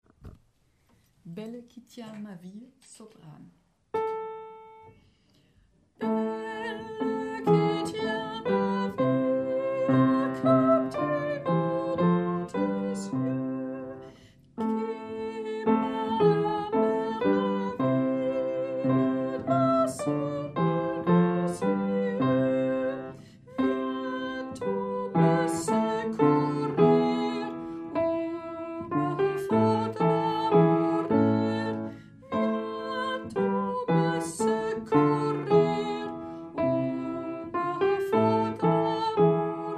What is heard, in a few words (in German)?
Sopran, Chor; Belle, qui tiens ma vie; chor ag